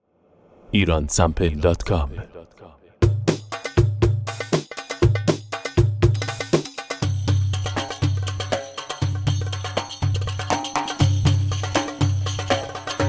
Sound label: arabic, loops